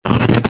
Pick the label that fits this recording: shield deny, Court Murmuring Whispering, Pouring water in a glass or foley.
shield deny